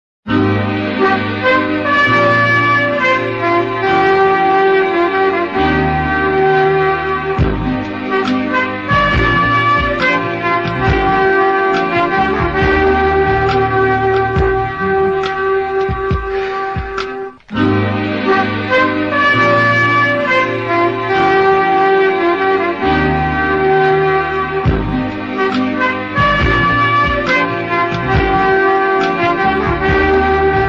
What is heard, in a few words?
mass bgm; amaidhi padai; sathyaraj